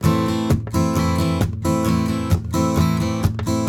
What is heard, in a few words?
RIFF, Blues Guitar; ILIO; Fretworks